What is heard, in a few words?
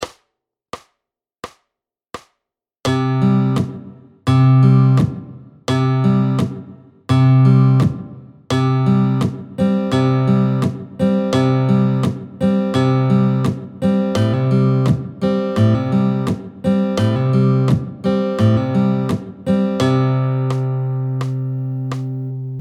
Percussive Fingerstyle